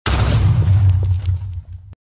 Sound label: creeper explosion; minecraft